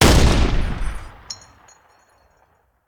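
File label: Artillery Cannon Anti Aircraft; Sound Ideas; Guns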